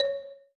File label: Button; UI